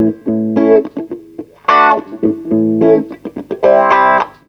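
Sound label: guitar, Roots Funk; USB Soundscan vol.41; AKAI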